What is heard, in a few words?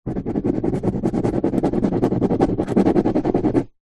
pen ball point scribble scrub out paper on plastic table; office